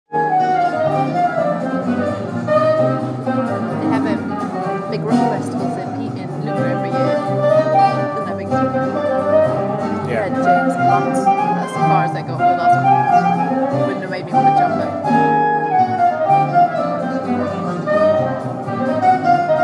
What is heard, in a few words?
bargajazz outside our restaurant